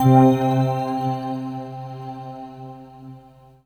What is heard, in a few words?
synth bell